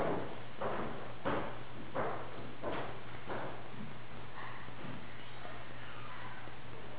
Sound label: Windmill Going Upstairs; Matt